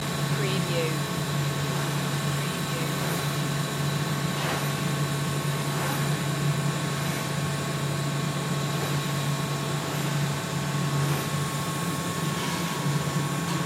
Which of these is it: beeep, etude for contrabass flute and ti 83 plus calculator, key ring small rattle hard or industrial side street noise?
industrial side street noise